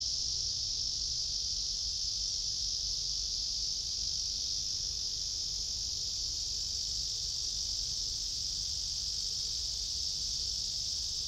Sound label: yamanakako sound